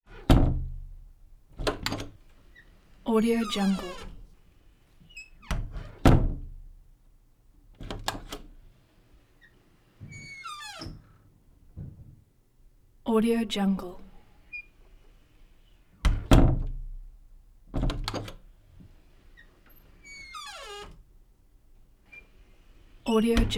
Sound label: wood door open close bathroom; prosoundfx